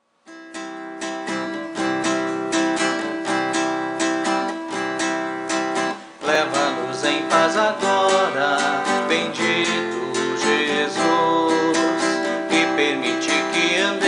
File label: vocal